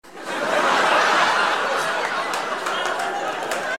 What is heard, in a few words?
laugh applause